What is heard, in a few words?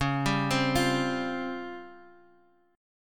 Db Major 7th Flat 5th, Bass Standard; 21,22,22,22; arpeggiated; chord shape; JGuitar